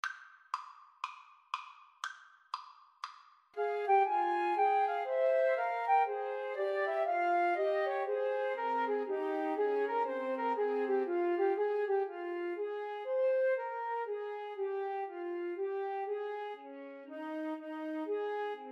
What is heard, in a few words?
bach bouree, Flute Trio